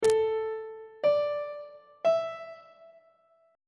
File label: g double sharp suspended 4th triad chord intervals on treble clef